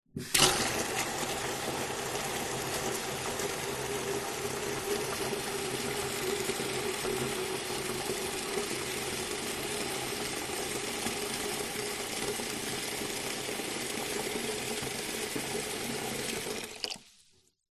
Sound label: household plastic empty bucket fill up water tap in metal sink; zapsplat